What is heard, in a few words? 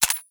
Rifle Mech; Warframe; Weapons; Tenno; Sundial